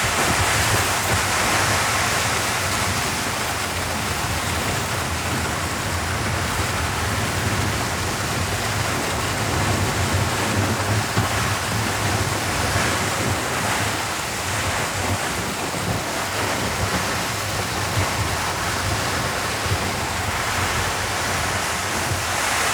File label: ice slide loop; teardown ps5 windows xbox series xs gamerip 2022